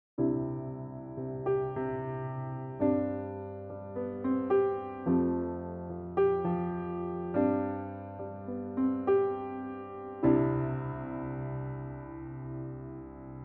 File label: Piano Close